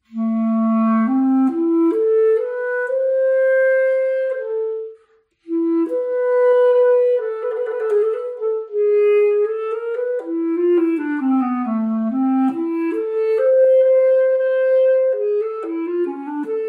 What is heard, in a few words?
Basset horn; کرباست